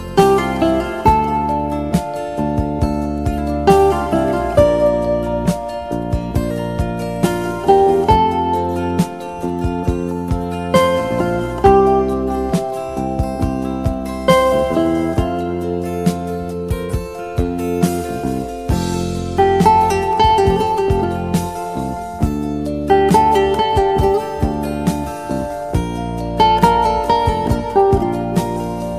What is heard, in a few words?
instrumental